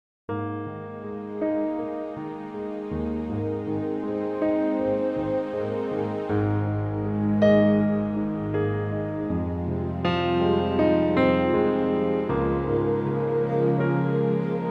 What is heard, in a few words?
Ab orchestral